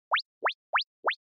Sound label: Anime Motion Phrase